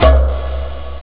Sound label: kalimba; jazz jackrabbit 2